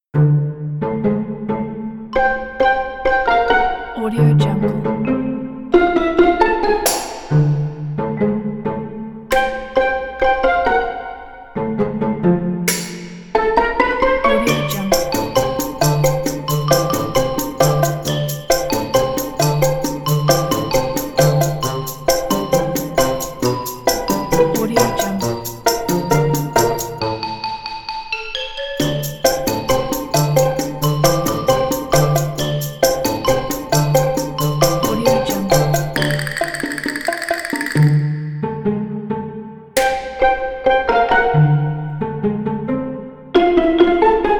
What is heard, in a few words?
suspicious dramedy